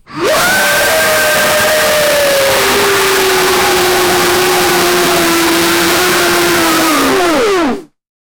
a very loud chest driven, farm

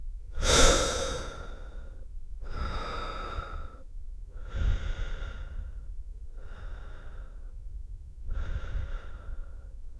puff of smoke

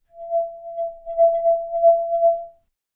objects

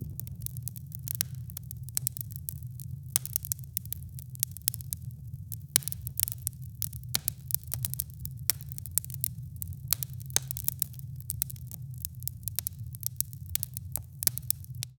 a soft steady fireplace, ambience

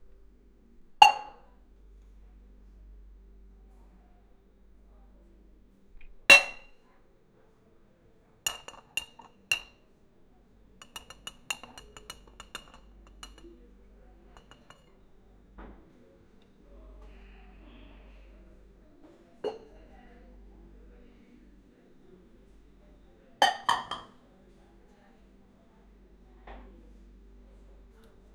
small european cafe dista

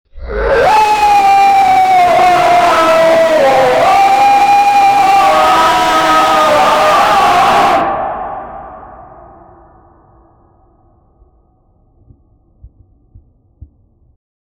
the frantic screams of a, agony

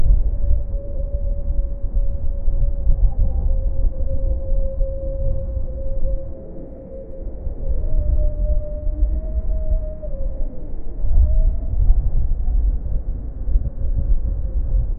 desert ambience; distant animals

